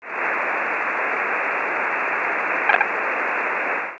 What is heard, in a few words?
radar 23cm; elektrosound